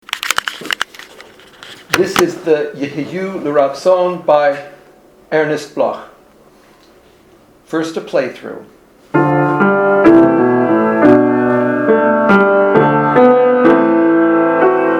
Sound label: play-through